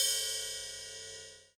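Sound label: ride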